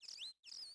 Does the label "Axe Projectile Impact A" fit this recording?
No